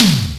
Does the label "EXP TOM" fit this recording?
Yes